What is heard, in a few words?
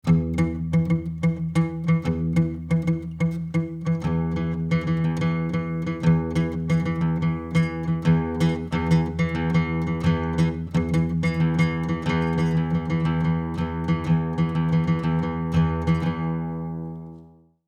Subdividing Standard; West African rhythms